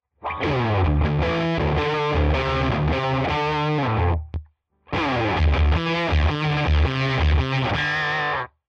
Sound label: OpAmp Octave max OFF ON; LifePedal